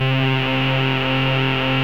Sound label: ODY, ARP Odyssey